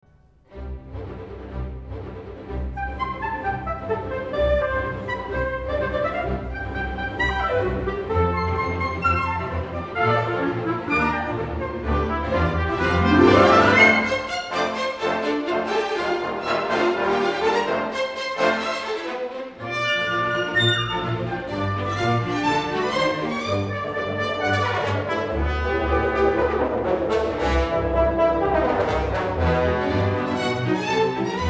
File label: Celebration Music